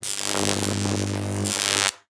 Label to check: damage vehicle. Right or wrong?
Right